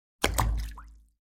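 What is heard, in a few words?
sounds of human footsteps in water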